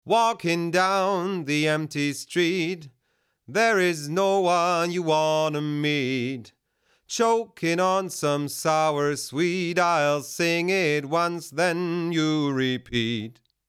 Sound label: Vocal; Black Lion Audio Bluey